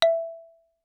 Xylophone hard rubber ff E5 stereo; MIS Pitches - 2014; Percussion

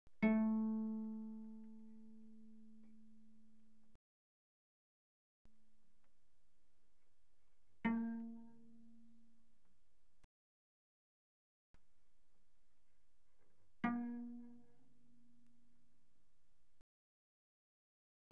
Cello pizz pp sul A A3 B3; Strings

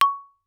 Xylophone hard rubber ff Db6 stereo; MIS Pitches - 2014; Percussion

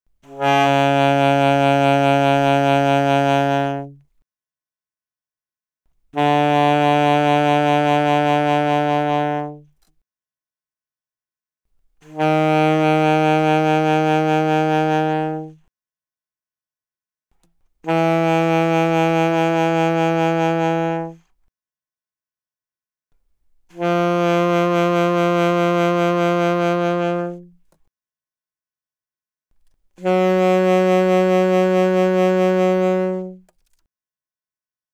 Alto Sax Vib ff Db3 B3, alto saxophone

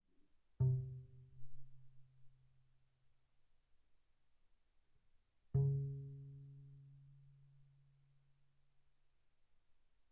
Bass pizz sul D pp C3 B3, double bass

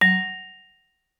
Vibraphone dampen ff Gb3 stereo; MIS Pitches - 2014; Percussion

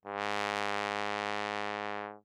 Tenor Trombone ff Ab2; Brass

